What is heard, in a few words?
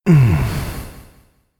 sexual sigh echo